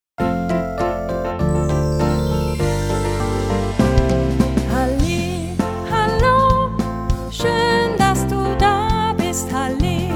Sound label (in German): Gesang deutsch